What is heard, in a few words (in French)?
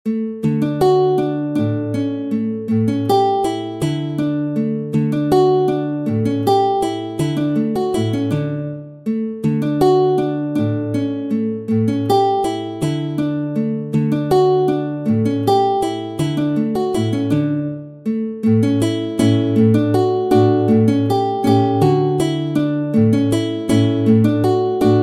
Walze, Méthode pour la guitare; Ferdinando Carulli; Op. 241; Classical guitar